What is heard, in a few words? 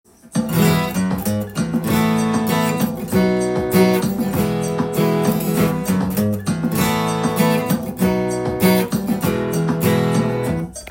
percussion and rhythm section